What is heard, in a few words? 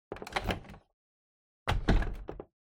Air Purifier (Put Down)